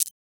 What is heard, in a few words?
Stylish UI